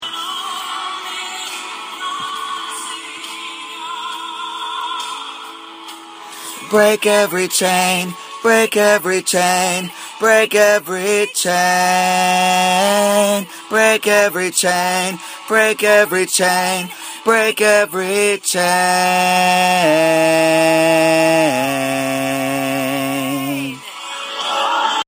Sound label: 3rd below